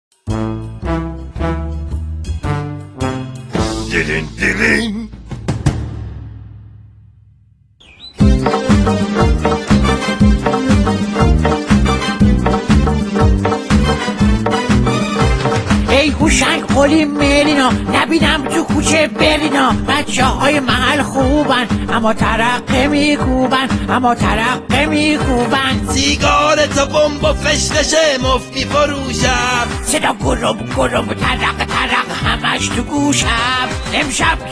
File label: remix chaharshanbeh sori remix; radio javan